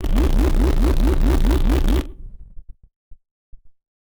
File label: random synth failure, malfunctioning synth